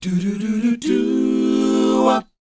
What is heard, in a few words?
DOWOP D, Vocal R&B DOO WOP; ILIO; Vocal Planet